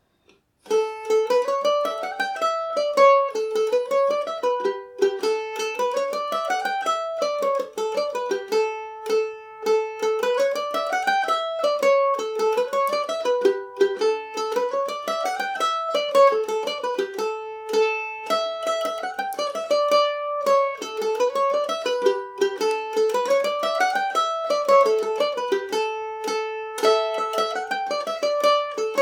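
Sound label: A mixolydian, Irish mandolin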